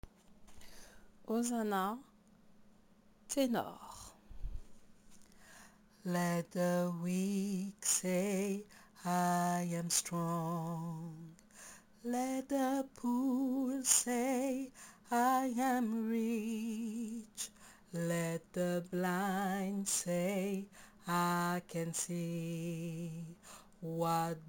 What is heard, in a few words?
Hosanna Tenor